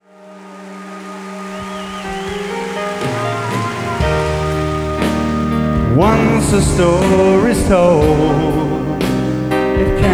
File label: pop male